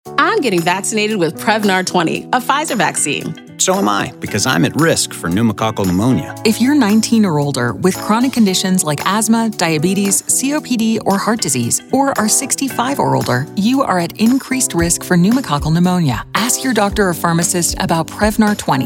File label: HipHop